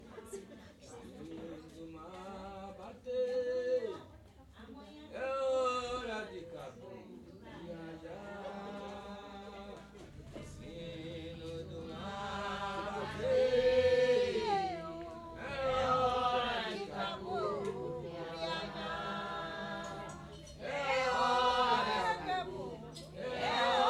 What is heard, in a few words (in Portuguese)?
tambor de mina; fanti